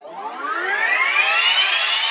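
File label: after coin win fx, slots; pork knox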